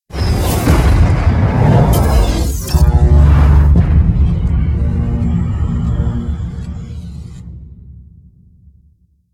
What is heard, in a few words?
splash; mechs mercs black talons linux macos windows gamerip 2015